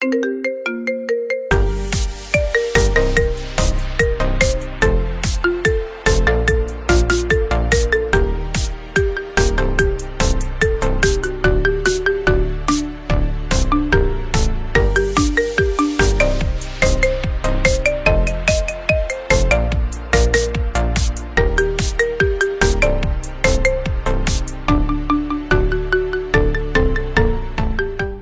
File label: Marimba Remix